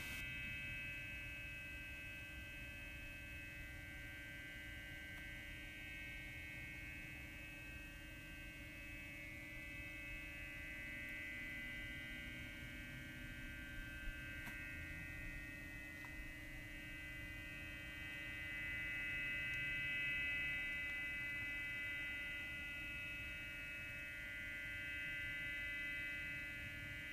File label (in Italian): Rumore ore 2.30 in via Termi Quiliano, Rumore assordante di notte proveniente dalla discarica